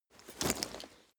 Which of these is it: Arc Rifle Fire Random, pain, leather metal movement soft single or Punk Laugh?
leather metal movement soft single